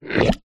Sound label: cartoon slide unblock; zapsplat